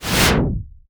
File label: IMP WHOOSH